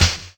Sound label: raw snare f key